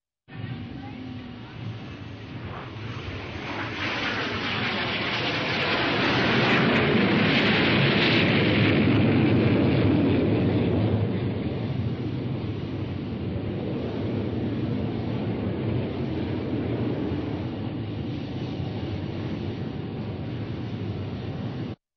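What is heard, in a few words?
plane landing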